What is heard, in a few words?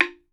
BAMBOO, World Assortment